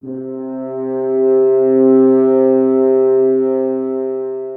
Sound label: french horn soft